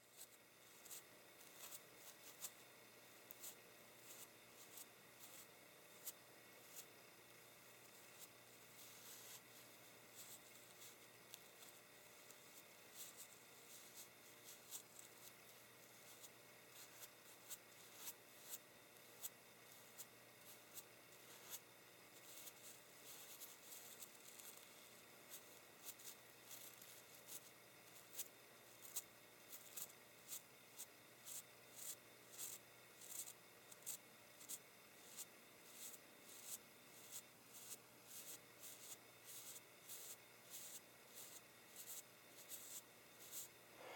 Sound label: Tapping and Brushing Cleaning ASMR